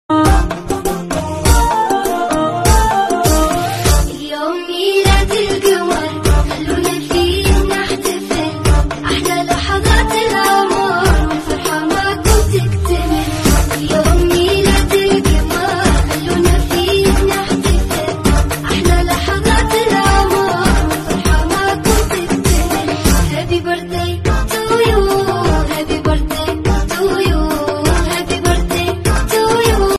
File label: arabi seda zan